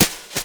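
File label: jazz drum snare drum; AKAI S6000 CD-ROM - Volume 1; DRUM RYTHM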